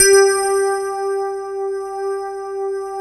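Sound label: Rhodes G2, left; East West - Phat & Phunky CD2; 90's Sample CDs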